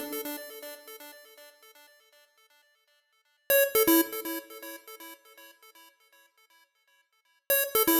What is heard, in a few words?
Mono Synth, 120bpm D; Construction Kits; Zero-G; Nu Disco; Happy People